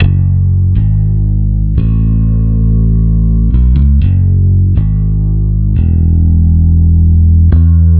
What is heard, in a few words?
Bass Guitar 120BPM F#m; 99Sounds x Monosounds; Instrument Loops